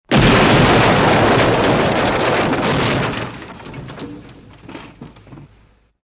razbivaet stenu dvuhjetazhnogo stroenija; Звуки строительных работ; снос зданий; Звуки строительных работ обычно связаны с разрушениями, такими как снос зданий

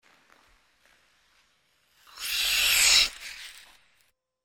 krupno proezd; коньках о лед; Звуки катания на коньках; tormoz

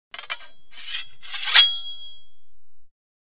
metal po metallu i skolzhenie rezkoe; Звук мечей; Звуки сражений на мечах, звук ударов меча

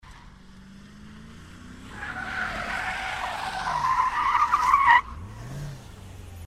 rezkij vzvizg pri vnezapnoj ostanovke

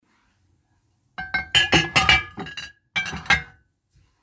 tri butylki berut so stola

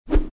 vzmah; Звук мечей; Звуки сражений на мечах, звук ударов меча

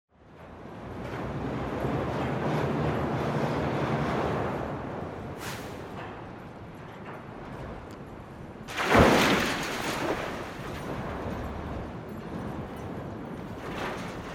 atmosfernye zvuki razrushenij na strojke; Звуки строительных работ; снос зданий; Звуки строительных работ обычно связаны с разрушениями, такими как снос зданий

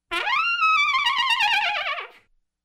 imitacija rzhanija loshadi na trube; chto gde kogda

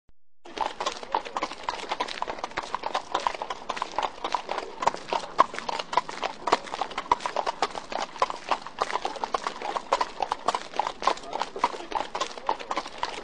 zvuk kopyt neskolkih loshadey slyshny dolosa

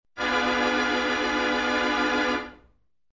dramatichnaja situacija organ

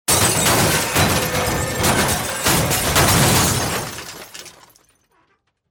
padenie mashiny

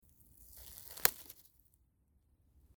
hrustnula odna vetka

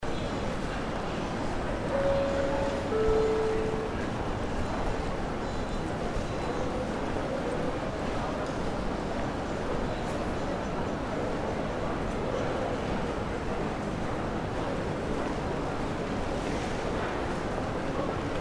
fon ajeroporta s signalom uvedomlenija; Звуки аэропорта

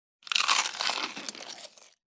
hrust chipsov; Звуки откусывания

